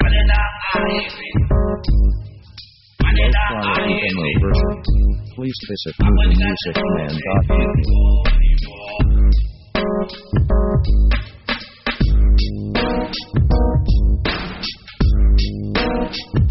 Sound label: hip hop dramatic orc; Basically slow